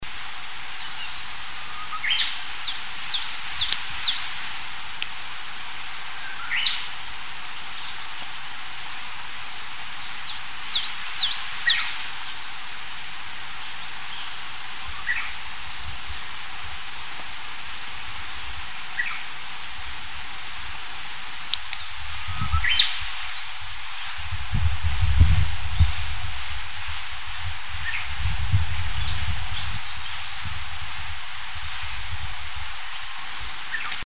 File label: bush warbler